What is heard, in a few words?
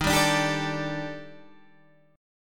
Eb Major 7th Flat 5th, Guitar, Half Step Down; EbM7b5